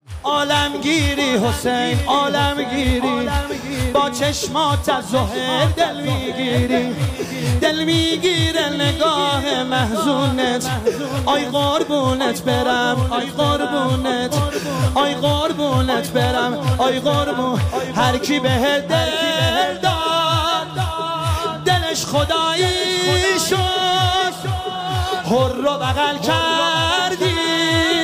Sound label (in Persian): مداحی; شور